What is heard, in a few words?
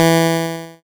GB Lead E; ChipShop Deluxe; ChipShop Hits